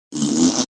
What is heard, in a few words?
Mouth Fart, Raspberry, mouth FX, deep, (fart), (human voice); Exclamation Sound Effects